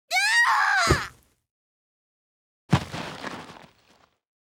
die; CORFEMALE

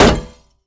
Rifle Metal, Damage

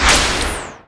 BLOW

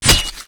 Axe Scale, Damage

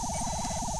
WAR WALK; HOBOMITE; Mob

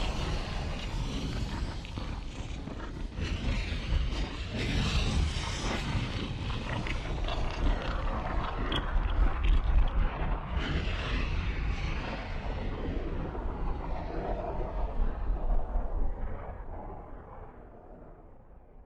Volcano Monster, ambience

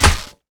Axe cloth, Damage

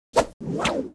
Double Crash

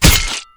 Spear Skin, Damage

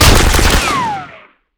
Mgun Shell

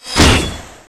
Arrow Metal, Damage

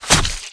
Knife Skin, Damage

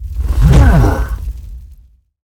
War Attack; Greatblock

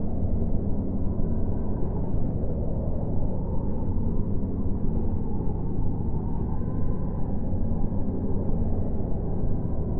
Desert, ambience

